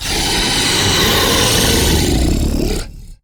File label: Death; Warframe; MiTW